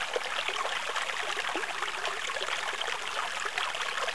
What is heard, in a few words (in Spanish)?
agua